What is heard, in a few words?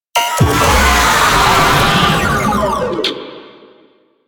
Proto Infested Babau Player Spotted, Vocals